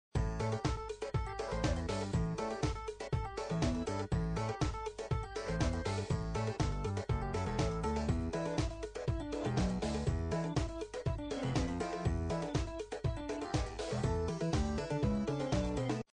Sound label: music player; Funky moves music player